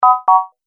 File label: Multimedia Completed, beep; Beep Sound Effects